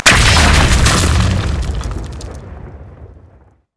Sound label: spider smash; LoL